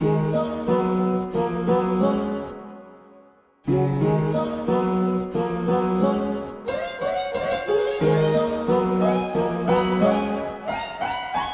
ruins piano; Gachitale

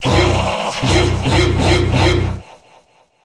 laugh; hypergoner; Gachitale

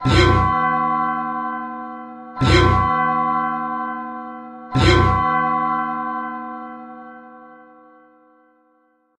church bell; Gachitale

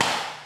door open; Gachitale

